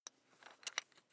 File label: electric drill bit unfasten wrench turn